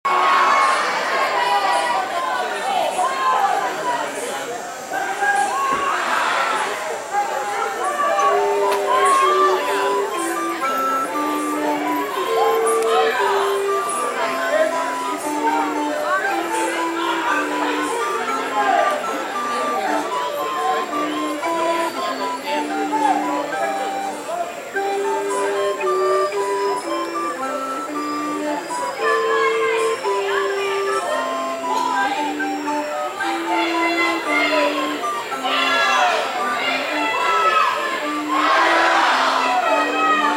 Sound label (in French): flutes a bec